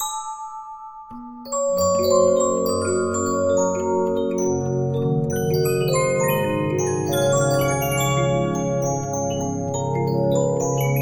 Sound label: Regina, 15inch disc musicbox